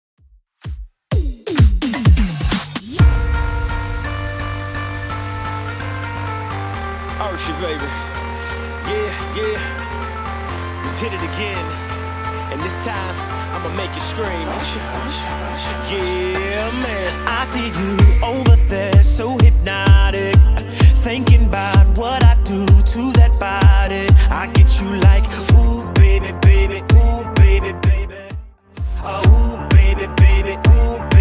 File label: 2ks Redrum 128bpm